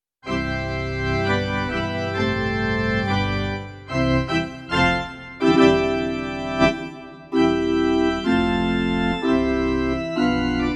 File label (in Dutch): Kerkorgel, Roland FR-8X V-Accordion; church organs